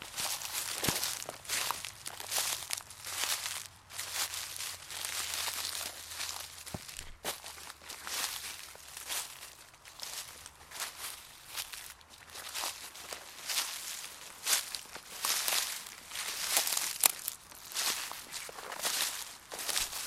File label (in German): schritte rascheln im gebuesch; salamisound